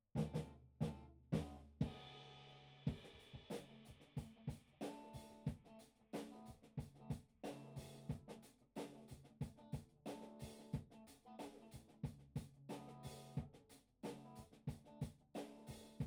Bass Amp

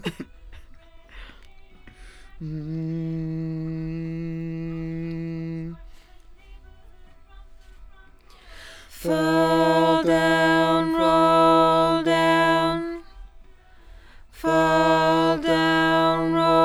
LdVOX Double

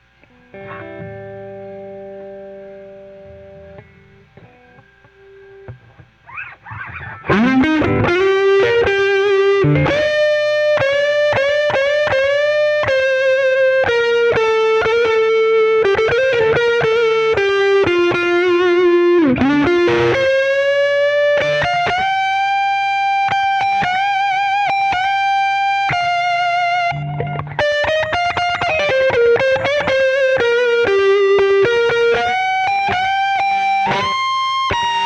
Solo Gtr

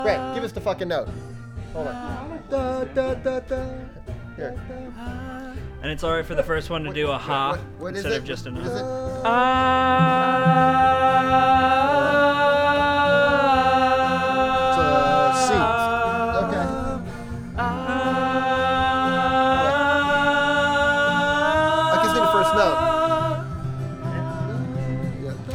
VOX Double

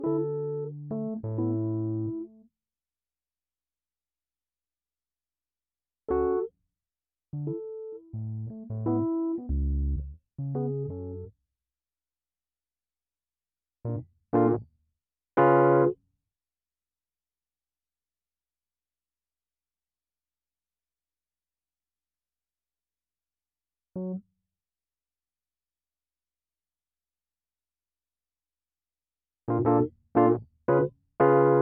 Keyz R

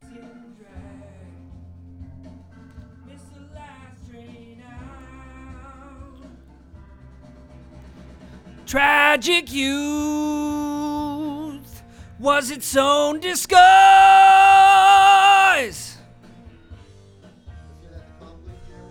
VOX

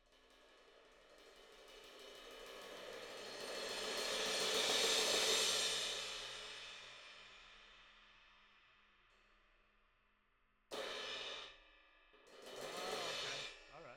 Cymbal

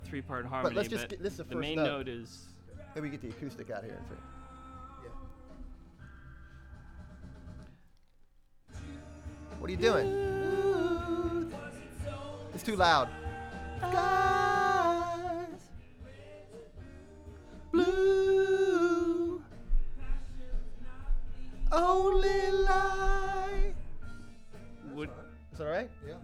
VOX Back

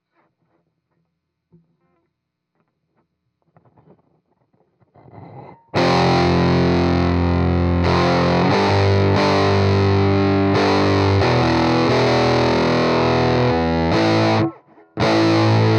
Heavy GTR

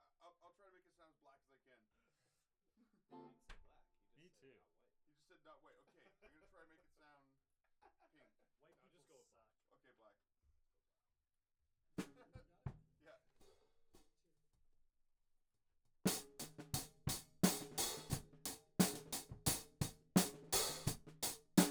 Hat

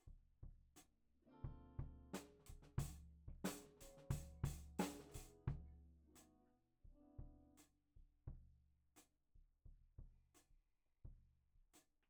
Floor

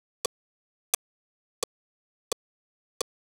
Click

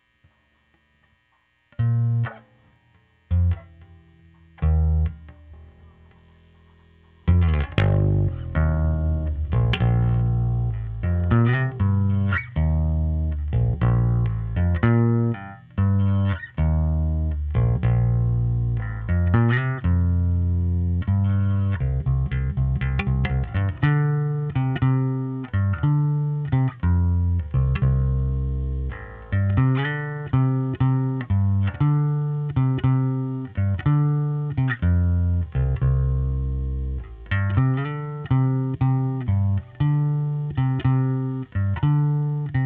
Bass OD

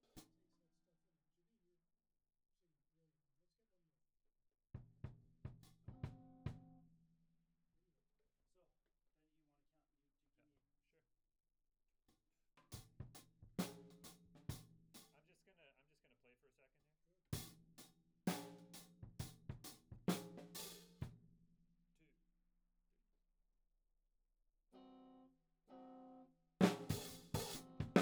Tom

